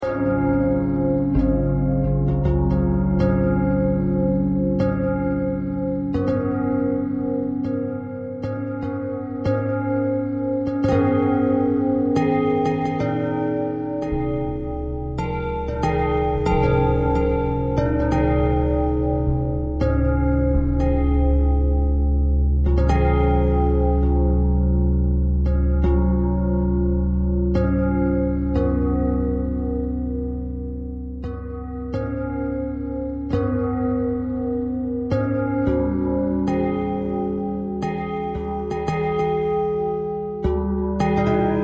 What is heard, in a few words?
bell thread